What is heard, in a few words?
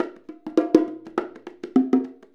Bongo; Essential Percussion